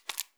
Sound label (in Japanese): 深めにストローク, ピストン運動 激しめ